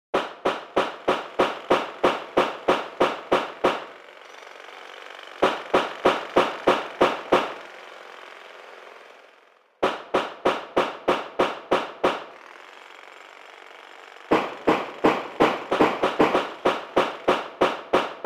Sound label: Building Ambience; animal crossing happy home designer